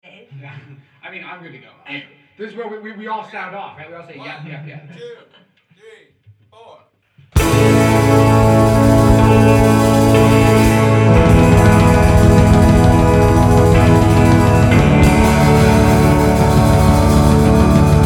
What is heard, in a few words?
Train Station